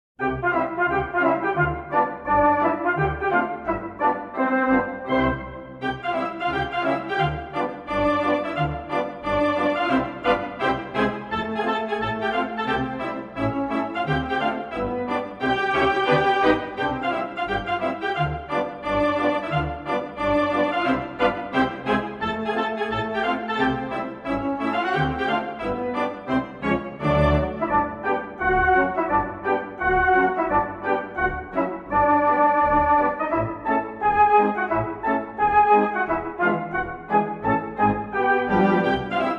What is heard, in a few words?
Polka, Organ; HW: Paramount 450